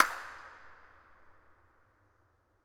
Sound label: incinerator dirac